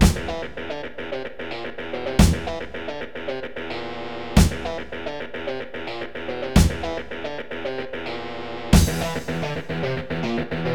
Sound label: Yamaha DS-XG, DOOM